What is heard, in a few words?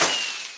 minecraft firework launch